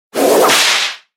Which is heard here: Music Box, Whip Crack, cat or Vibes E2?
Whip Crack